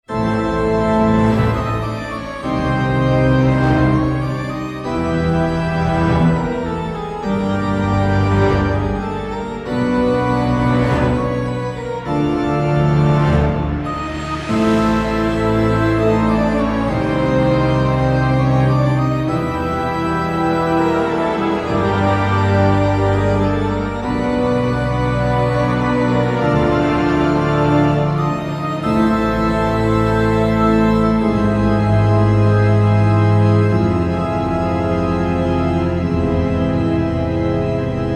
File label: Organ; Waycrest Manor